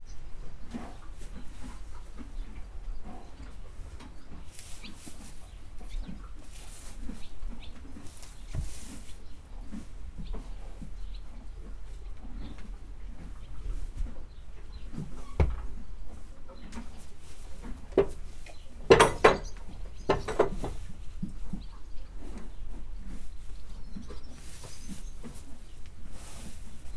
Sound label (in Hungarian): neszek zörejek, ló; mátai puszta; Hortobágyi Nemzeti Park 2013